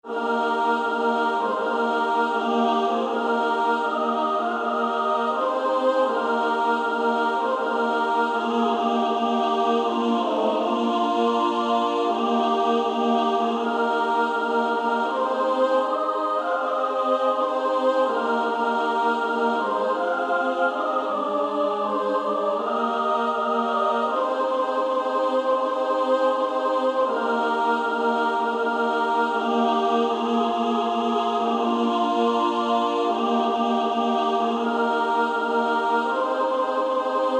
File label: Female Choir SSAA